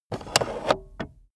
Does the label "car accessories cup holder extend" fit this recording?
Yes